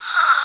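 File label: squeak hunt, zombie rat; hunger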